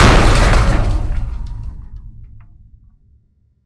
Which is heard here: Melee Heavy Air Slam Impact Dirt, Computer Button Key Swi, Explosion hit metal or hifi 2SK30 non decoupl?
Explosion hit metal